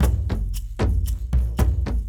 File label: Kendong, World Percussion; Brekete, Shaker